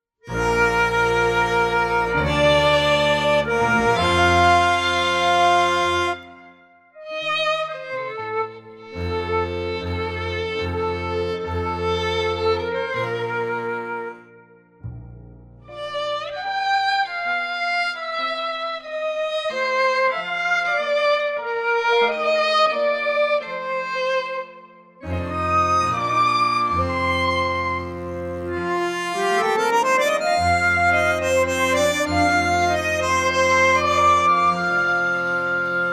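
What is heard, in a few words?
flute, B flat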